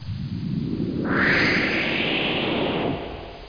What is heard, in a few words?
whoosh by, outdoors; Image Bonanza; Expert Software